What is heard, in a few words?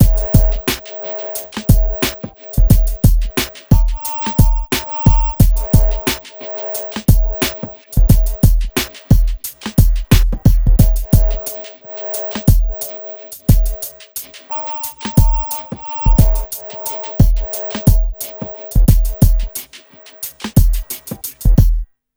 Hip Hop R n B; USB Soundscan vol.19; AKAI